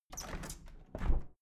window plastic squeak open heavy sequence

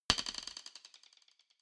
drumstick hit move soft

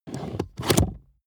plastic draw lock

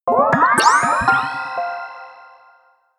sci-fi jingle positive

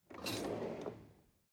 drawer cutlery draw in

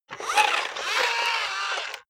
robot movement slow long

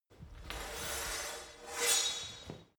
sword scrape

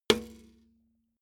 metal bucket hit bottom soft

